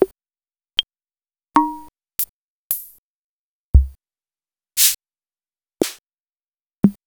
Vermona ER-9

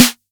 Snr, Korg KR-55B

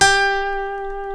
A, funk guitars; Spectrasonics; Hans Zimmer Guitars VOL-1; TAKA FUNK

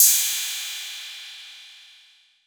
PERC 808

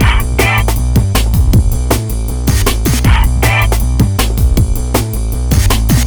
Hardcore Breakbeat, 158 Amin; Big Fish Audio - Hardcore Breakbeat Action; Hardcore Breakbeat Action CD1

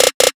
Trap Drum Fill

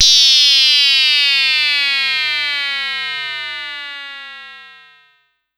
Pollard Syndrum

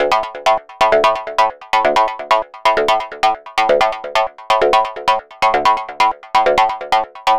NRG Lead, trance; Leads; Sonic Foundry (Sony Creative Software); Trance NRG VOL-1

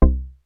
moodular bass, Kawai; Rubber Chicken Software Sample Bank

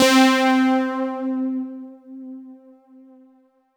Roland JV 2080, interval; MG INTERVAL

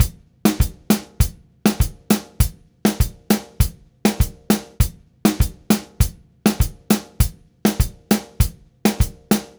Zouk, Latin Percussion, Drumset; Sonic Foundry (Sony Creative Software); Joe Vitale Latin Percussion

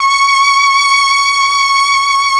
STR, STRING; ILIO; Propeller Island Legendary M400

